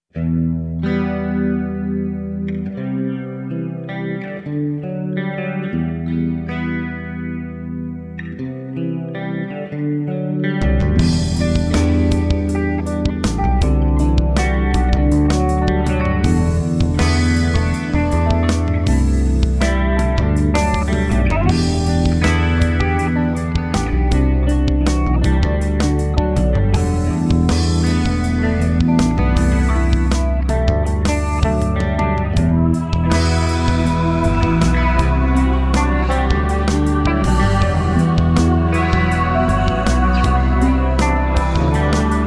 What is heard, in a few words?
smooth groove song